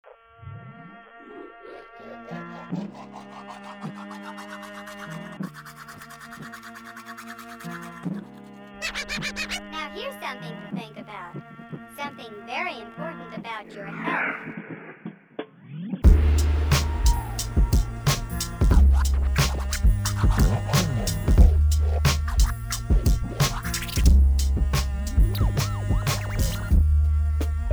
hip-hop; bbbar batle of beatmakers round 1 hip-hopru

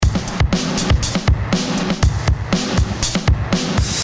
drum loop compressed

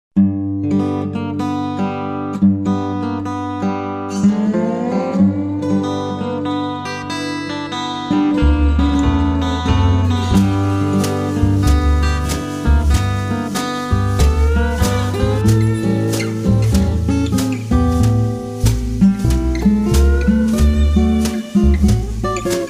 acoustic rag

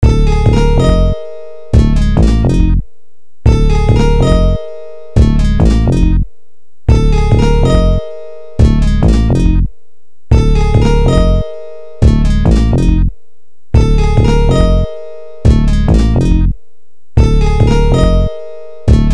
instrumental

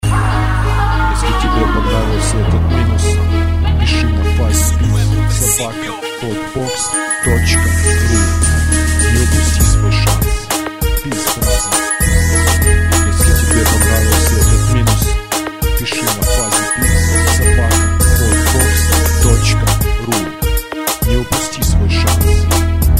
reggaeton

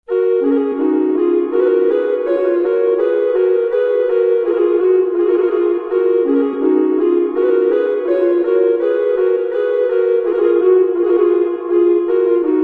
sonata for two flutes one bass g dur gavotte; shedeville; 3part gavotte1e2 la nanette la silvie

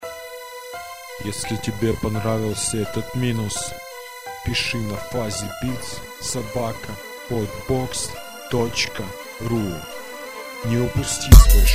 depressive

